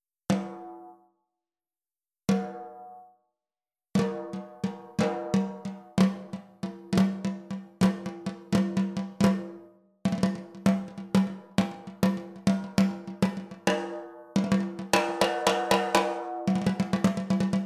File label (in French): PORK PIE MAPLE 14X6 MIX, CAISSES CLAIRES